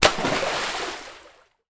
nature water splash child jump into pool belly flop